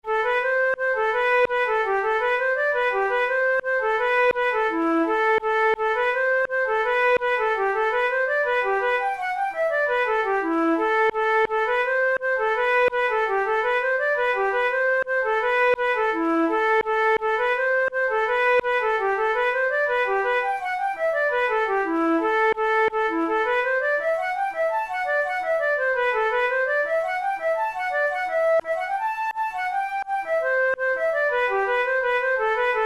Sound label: hornpipe, flute